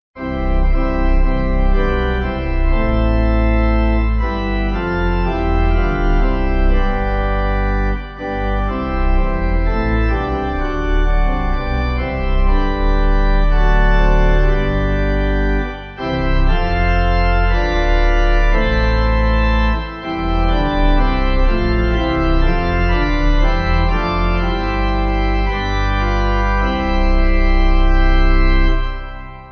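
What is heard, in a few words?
Pipe, Pipe Organ backing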